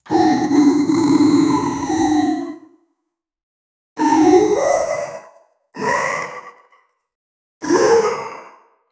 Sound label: short video game fox, animal vocalization